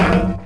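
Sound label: land metal; ambient; Shadowman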